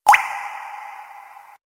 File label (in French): goutte d'eau